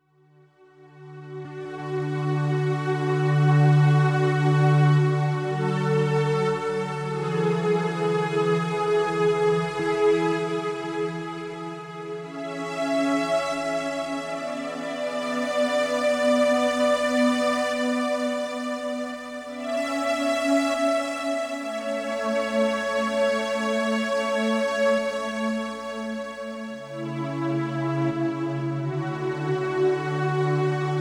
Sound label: Guitar Soundscape extract